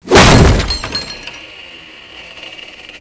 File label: kick door; Mafie 1